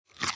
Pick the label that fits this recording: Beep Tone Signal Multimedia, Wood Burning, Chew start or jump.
Chew start